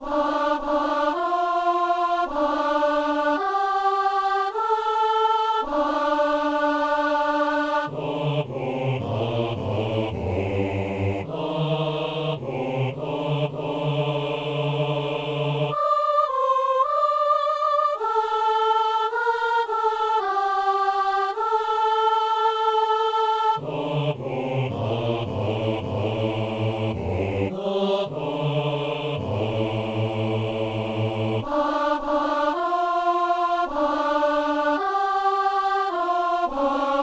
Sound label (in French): messe simple kyrie soliste et basse